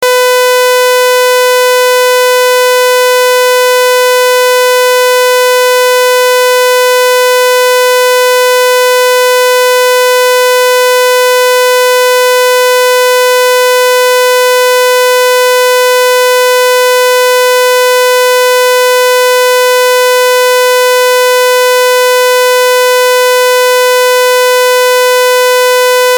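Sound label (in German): Sägezahn 500 Hz; Sägezahntöne; Ongsiek Haustechnik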